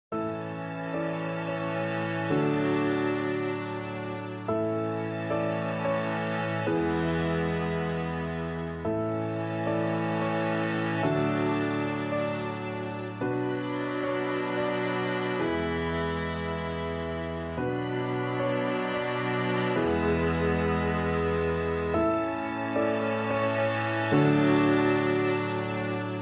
Piano and Strings Only

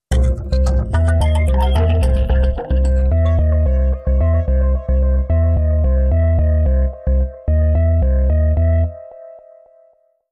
Techno

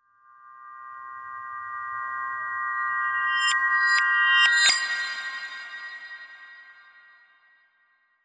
Tinsel Time Chimes Reverse

